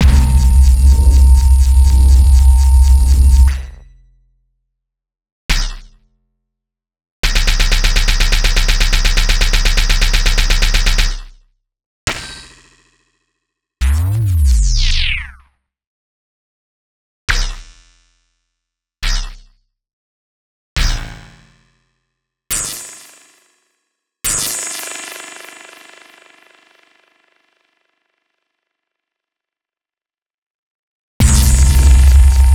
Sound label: laser weapon sounds; unfa; BurutaruZ v2